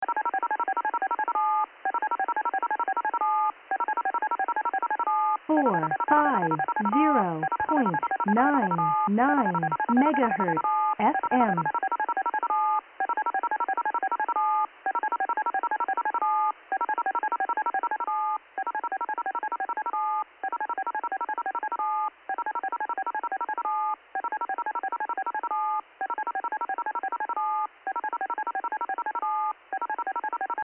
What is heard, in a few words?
fm, audio transmission; 450-99mhz